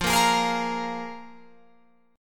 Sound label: F Minor Double Flat 5th, Guitar, Open F; Fmbb5 strummed; JGuitar